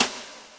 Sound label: snaredrum, Yamaha RM 50